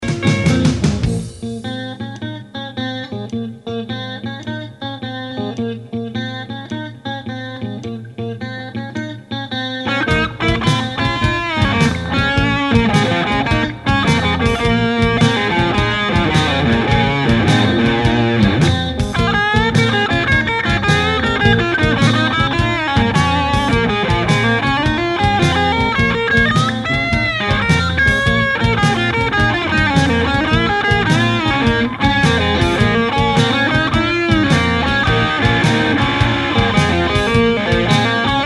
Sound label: walnut strat bridge, guitar